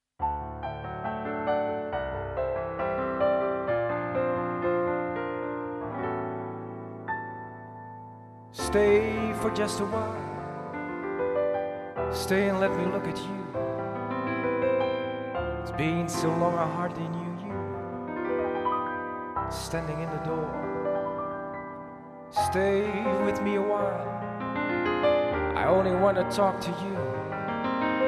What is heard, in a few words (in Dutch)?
ZANG + PIANO